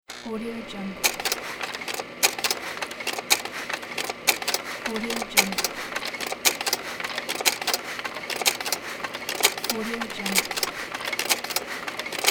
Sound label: slide projector operating fast